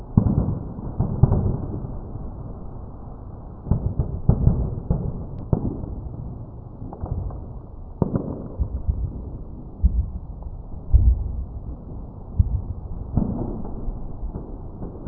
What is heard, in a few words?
distant battle, ambient